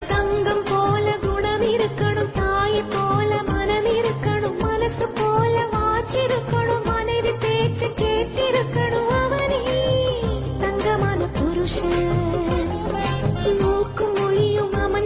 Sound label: song tamil